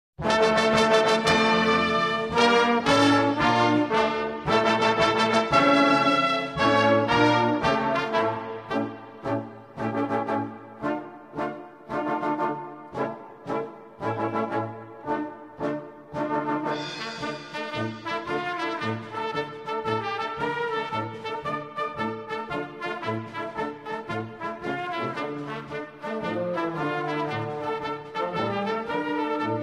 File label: Instrumental Indian Army Band; Kadam Kadam Badaye Ja; Indian Army Original Band